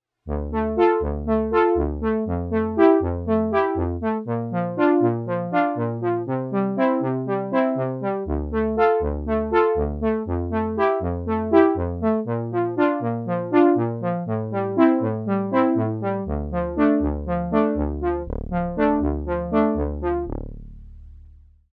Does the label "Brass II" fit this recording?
Yes